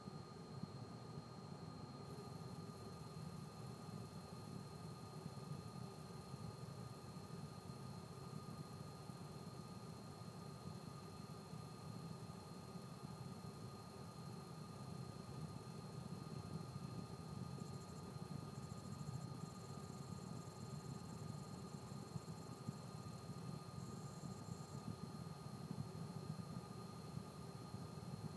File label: mac pro noise